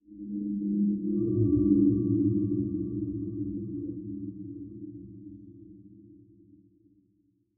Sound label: Ambient; Barotrauma; Subsurface